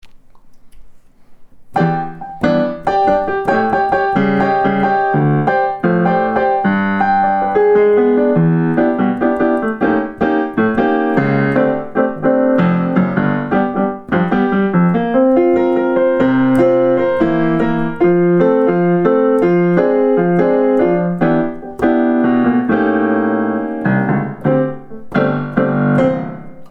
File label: piano